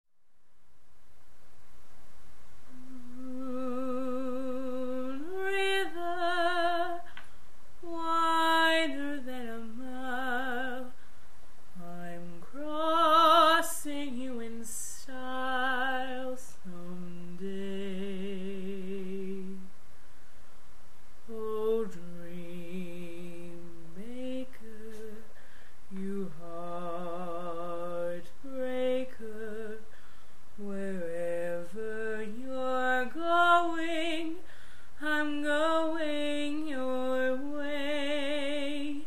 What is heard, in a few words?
singing, acapella; cover; classic; inspired by Audrey Hepburn